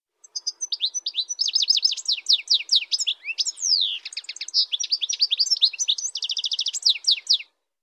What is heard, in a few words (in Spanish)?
jilguero europeo